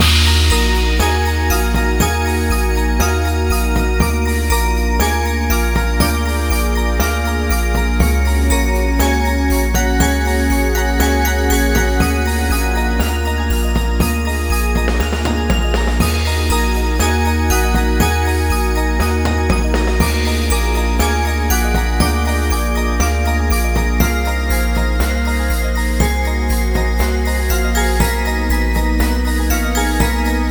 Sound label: castle action; Spiral Knights